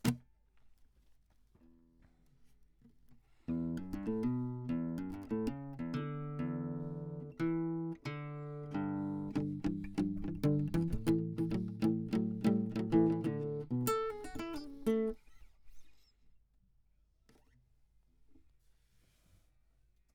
Classic Guitar

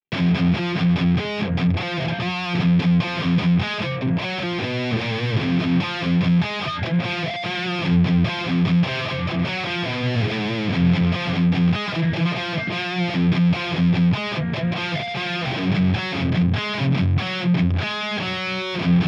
Guitar

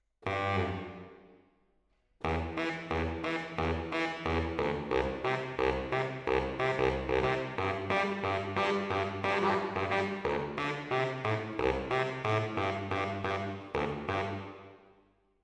MKH AB, piano

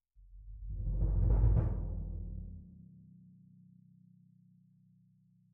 Cassa Rear

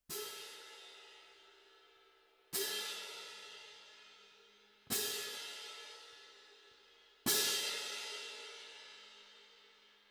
Perc

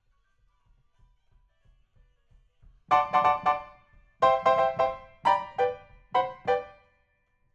PIANO, RNR for piano

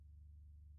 Piano Mono

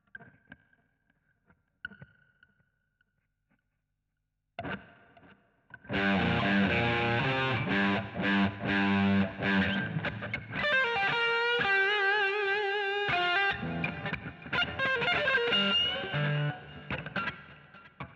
GTR A Solo

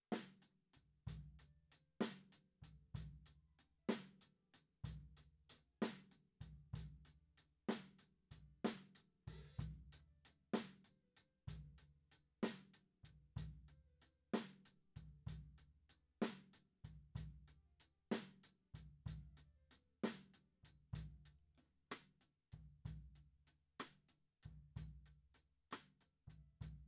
Floor

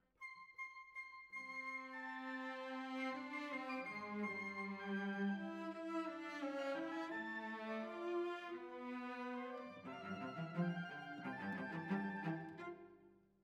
Cellos Over

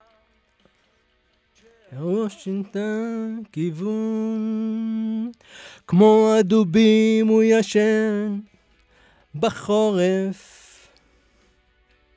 VOC

